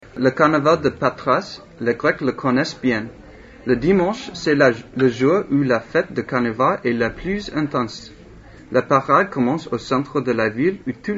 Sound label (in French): carnaval grec